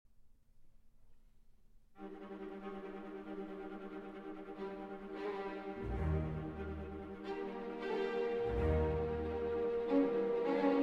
Violin Concerto in G Minor, Finale Allegro Energico